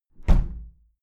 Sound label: window plastic squeak close regular